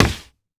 Foley Footstep Hard Sole Dress Shoe Skid On Hollow Wood Surface Low; Foley Footsteps